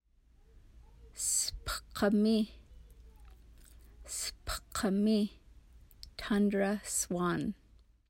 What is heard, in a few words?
Tundra Swan